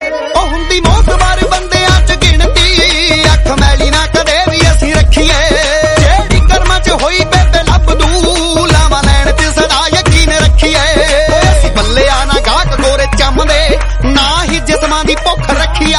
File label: Punjabi Ringtone